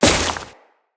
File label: Crewman Step Veg, Footsteps; Corpus